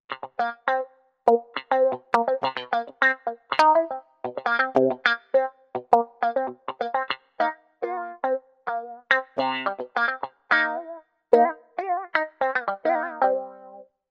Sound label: Cut Thru Wah